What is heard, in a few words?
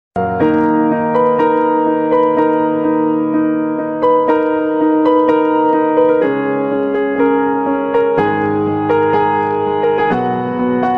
flute ringtone, romantic ringtone, instrumental ringtone